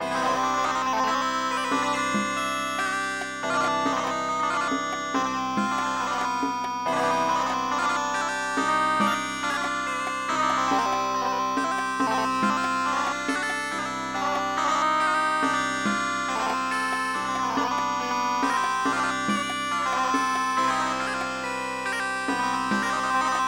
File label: Indian Traditional